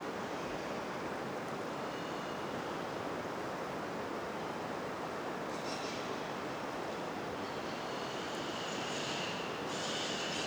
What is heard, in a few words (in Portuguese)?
Periquitos vem e vao caverna terra ronca muita reverberacao, rio; aves; gotejar; Coleção Sonora do Cerrado